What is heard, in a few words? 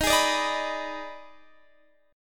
Eb Major 7th Suspended 4th Sharp 5th, Guitar DADGAD; down